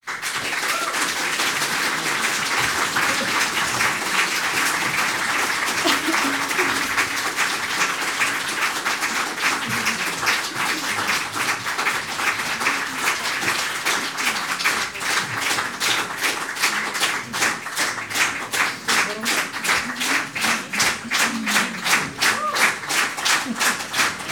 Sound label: Standing Ovation Small Audience